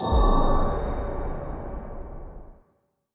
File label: small win; OceansGold; netent